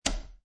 Poker Chips Place One Chip Onto Another On Wood Table; Gambling Sound Effects